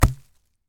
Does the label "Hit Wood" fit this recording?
Yes